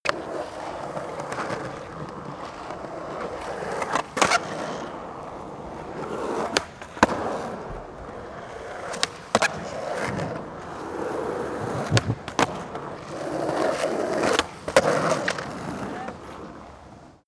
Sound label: skateboard drive multiple sequence